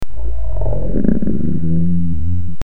silent beast growl

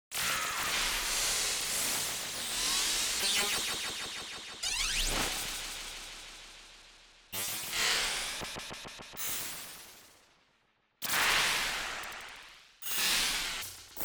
electric spell

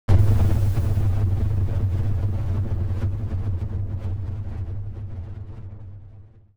thunderclap

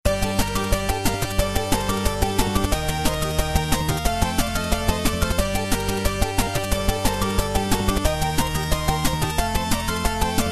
action

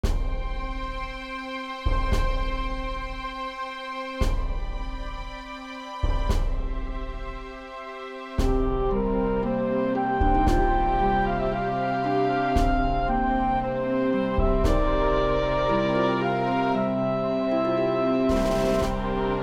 triumphant theme

